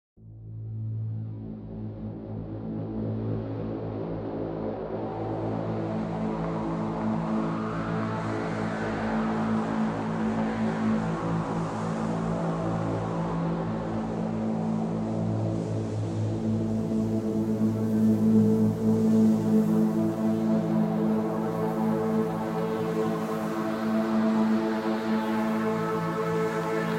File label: synth drone